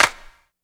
Piercing Snare One Shot C Key, acoustic snare sound tuned to the C note